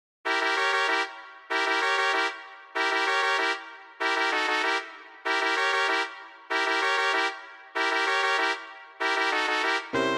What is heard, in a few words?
Trumpet in Bb